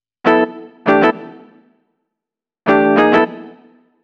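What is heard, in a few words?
100 Acid Jazz Guitar Chords A major; Pro Tools Essential Loops; Digidesign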